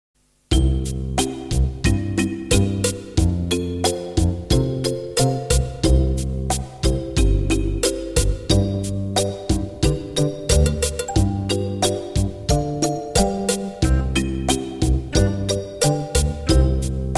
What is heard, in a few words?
Trap karaoke